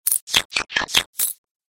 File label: monster spider poison